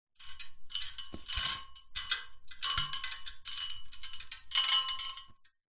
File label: household fairy lights wrap around metal pole; zapsplat